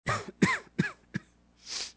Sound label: cough; Soldier Of Fortune II Double Helix; XBOX